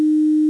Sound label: Dis 6 p 5